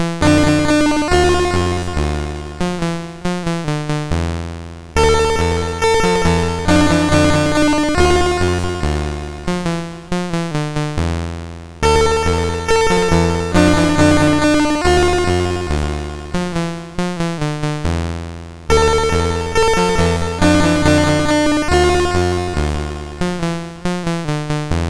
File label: Randomly generated music BASIC, sid MOS6581R2